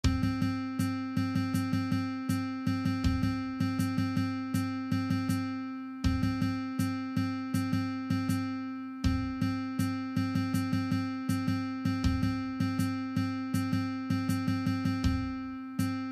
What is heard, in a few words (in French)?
Solfege